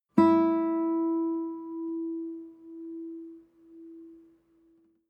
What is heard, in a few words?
nylon guitar single note high